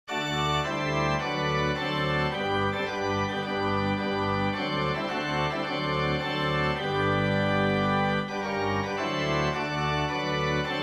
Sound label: organ; In My Heart There Rings a Melody